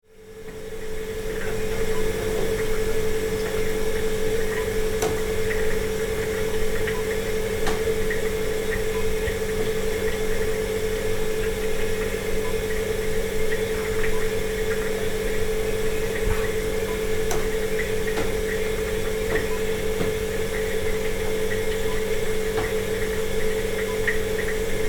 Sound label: Fridge Hum